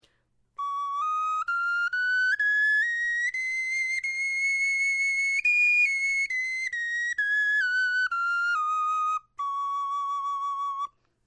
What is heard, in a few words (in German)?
tonleiter